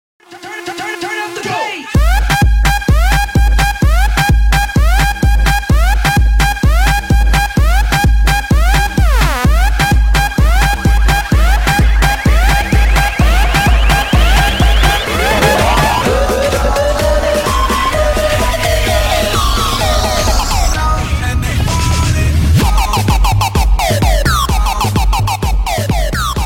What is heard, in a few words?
Bass Vs Beat, Ringtone; Versus Ringtone (VS)